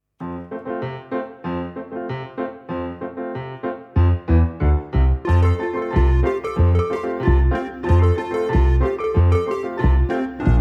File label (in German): Keyboardband